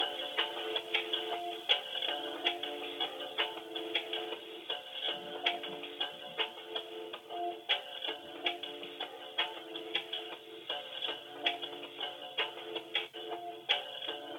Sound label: terrible hold music